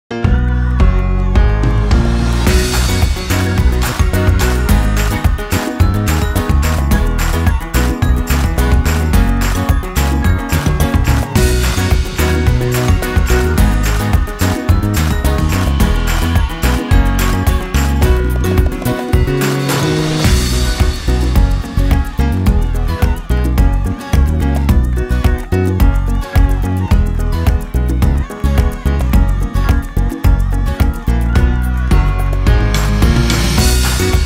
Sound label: instrumental